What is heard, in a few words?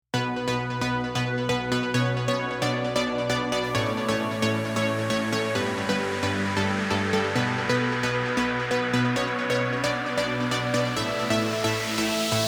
Audio Mix - 133 BPM B Minor